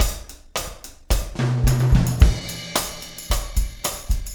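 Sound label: rim beat 4 4, Room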